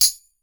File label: TAMBOURINES, Percussion; BRASS